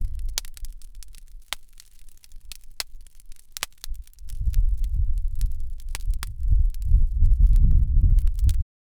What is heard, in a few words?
fire crackling branches